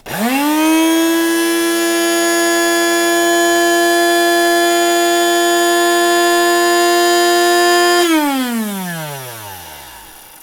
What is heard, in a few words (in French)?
moulin a cafe